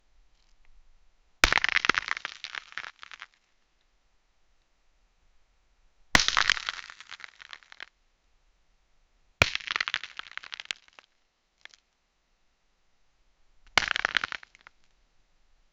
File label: loud crack in heavy, boulder